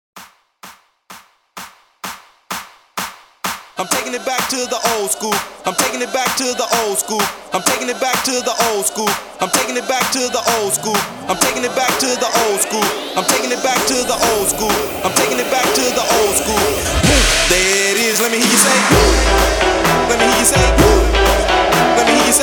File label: CLAPAPELLA 128 BPM